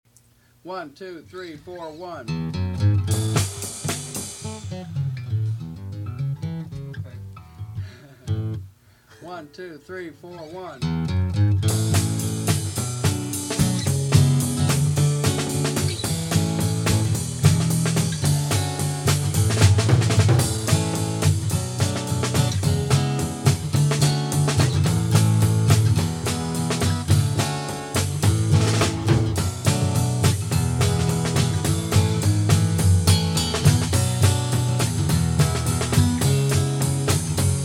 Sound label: early version false start; genesee; littlefield fountain